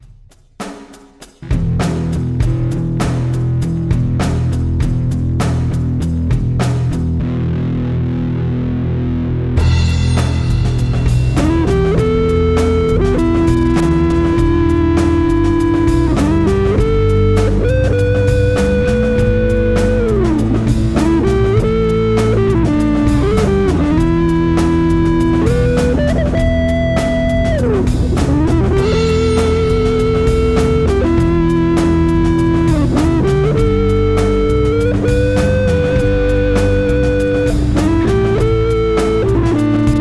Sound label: amp